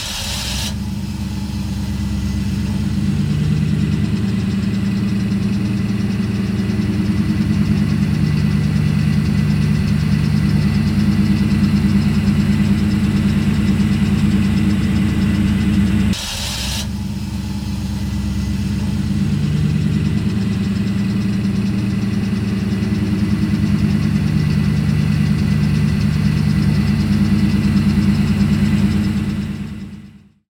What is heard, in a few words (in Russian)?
zvuk rabotayushego dvigatelya avtomobilya